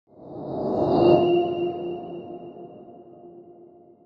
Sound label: Whoosh Crystal